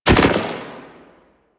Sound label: Shoulder Check Burst C; Missions; Tenno Ball; Tenno Scoop